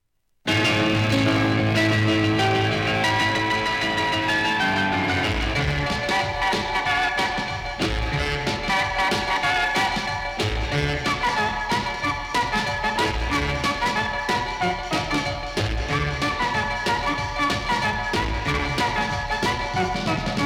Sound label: organ